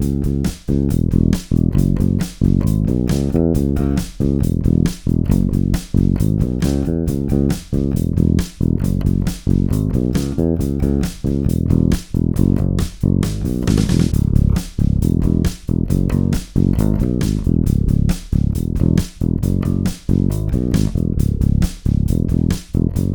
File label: rock